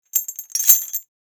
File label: Small chains movement rattle